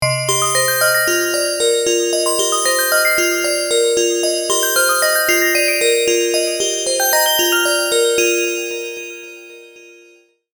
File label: fm analog bells 114bpm; vitality vol 10